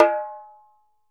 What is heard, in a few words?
ASHIKO, African Drum